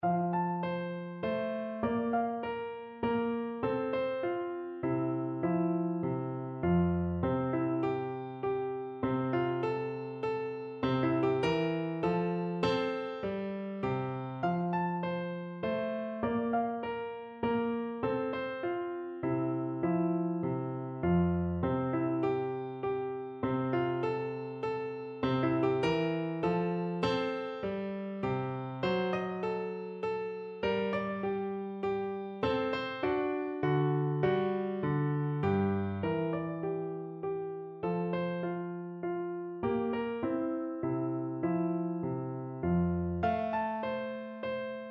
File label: Minuet in F Major Piano Beginner; Mozart